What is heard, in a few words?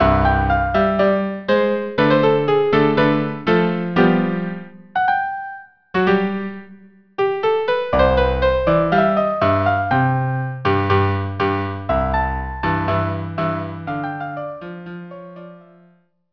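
violin viola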